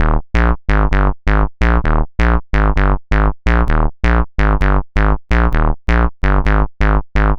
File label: Bass Loop, 130bpm; Zero-G; Soundclash Nu Dance Electro; Construction Kits; Feet First